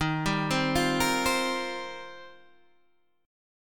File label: Eb 6th Add 9th, Guitar, Open D